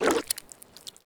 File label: spider alert; granny; zerosiki; slender